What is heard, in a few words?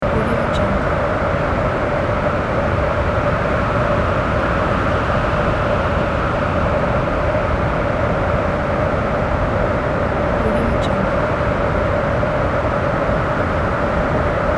snow storm wind loop; magicsoundeffects